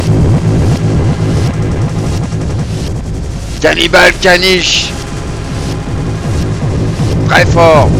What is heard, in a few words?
interlude; cloporte atomisé